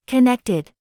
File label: connected; QuantumTerminal